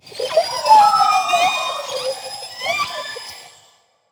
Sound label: BABY MOLOCH idle